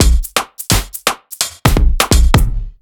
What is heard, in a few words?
Kit Heavy Swing, 85bpm; off the grid